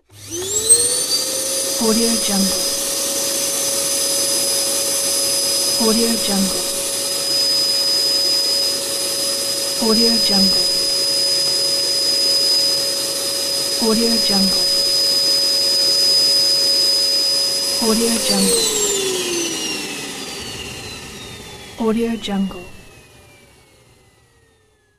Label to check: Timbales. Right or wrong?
Wrong